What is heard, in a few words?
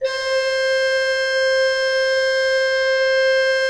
C4, Cathedral Organ, HOLZGEDAKT; Propeller Island